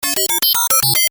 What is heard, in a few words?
Tech beeps, Beeps, High Tech